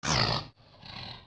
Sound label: Snort; Epona snorting